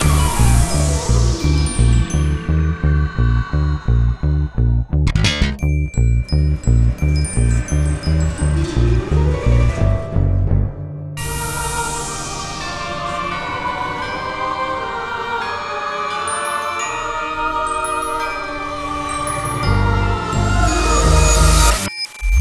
bgm

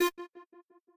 synth

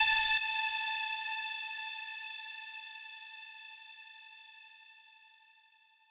lead3

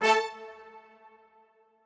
strings

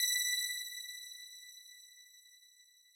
plack

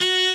cello

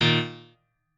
piano

